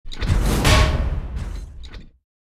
Steel Swing; starbites

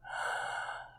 Sleepy breath

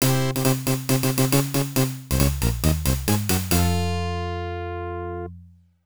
Fanfare; America Oudan Ultra Quiz

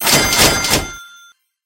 Cash Large; Burnout CRASH!; PS3; Xbox 360

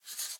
Sketch Env Write

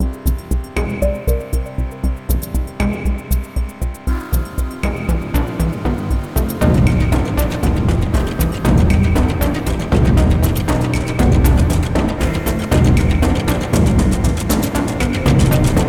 13 Bars 118 Bpm

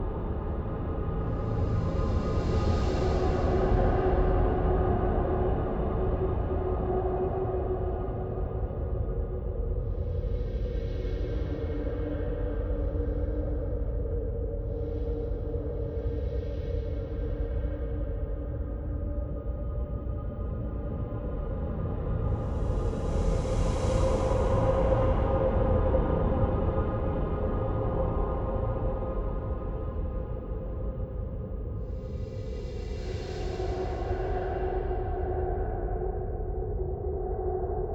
AMB Egypte Wind; Legend of Keepers

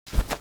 Jump

